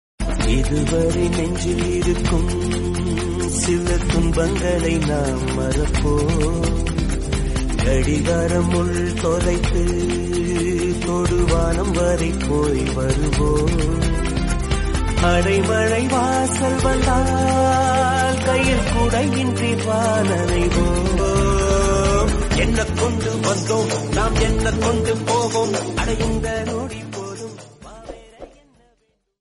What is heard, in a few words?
Tamil Ringtone; Ayan Movie Songs